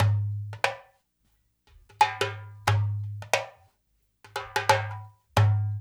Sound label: DUMBEK, Loops; East West